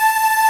Ending loop

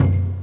hit, percussion hit

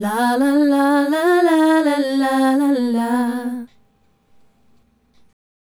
Female Group, Hip-Hop and RnB Vocals

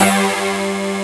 TR HD ATK, HOUSE

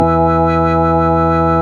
ORG Vox E, Continental Vox Wah Bar; Roland LCDP09 Keys of the 60s and 70s 1

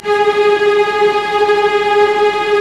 STR SEC; Roland - LA Composer vol. 1

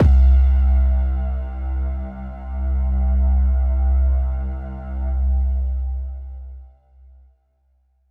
Synth Prophet Bass C; Electronisounds

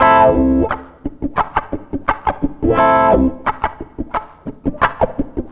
WAH, wah wah gtr; USB Soundscan vol.31; Miro s Grooves; AKAI

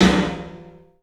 PA DEEP R, CLUB SN VRB; 3D Sound; 3D Drums

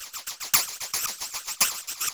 TAMB; Zero-G; Chemical Beats

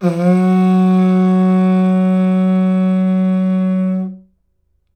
TUSK, IVORY HORN; AKAI S6000 CD-ROM; WorldSounds

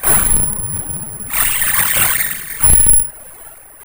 HARD NOISE; Big Beat Samples & More; AKAI

